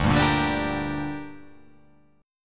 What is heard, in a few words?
D Suspended 4th Sharp 5th D, down